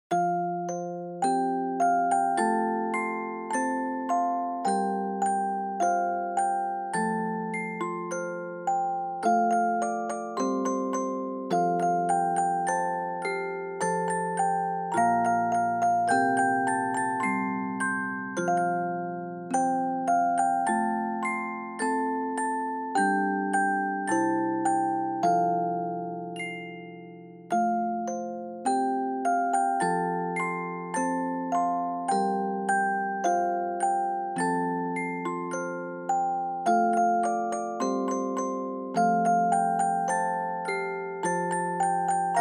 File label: musicbox